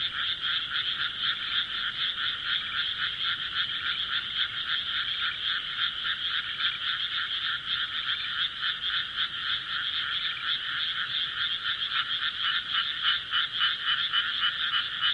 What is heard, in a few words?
frog